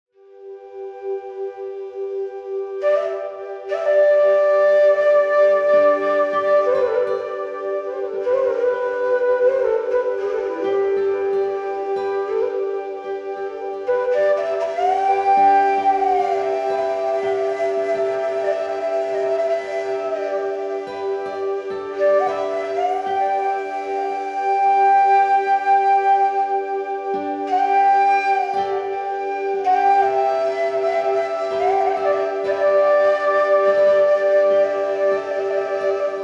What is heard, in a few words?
meditative flute; raaga; deepavali; festival of lights